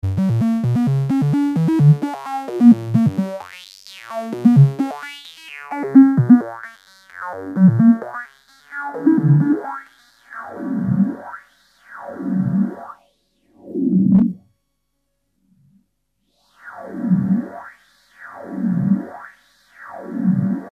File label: vco